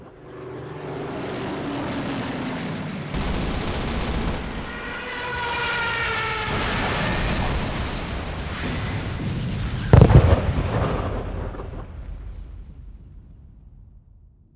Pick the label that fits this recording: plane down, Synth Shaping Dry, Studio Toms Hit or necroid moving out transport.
plane down